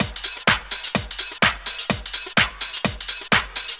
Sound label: NRG Percussion, Trance, Drums